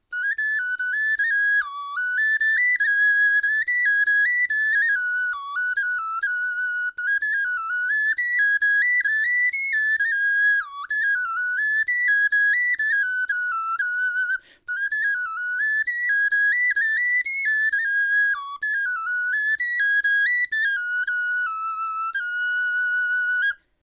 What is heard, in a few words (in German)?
melodie, knochenfloeten